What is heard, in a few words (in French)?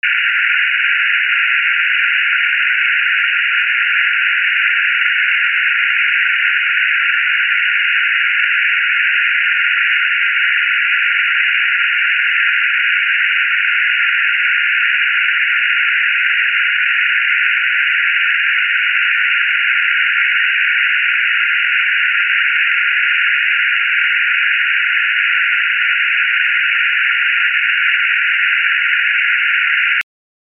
bruit rose